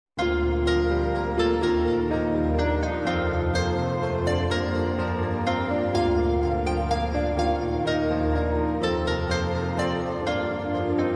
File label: music